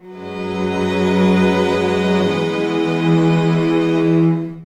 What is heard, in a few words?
Outta Chamber E, Strings; 02 Outta Chamber 2 E 155; Total Drum&Bass